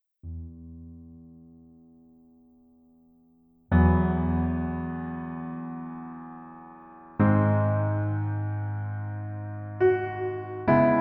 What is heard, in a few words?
Piano